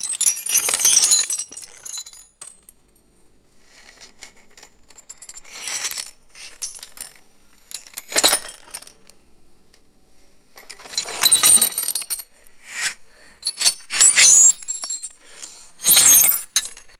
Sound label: small ceramic debris shifting and dropping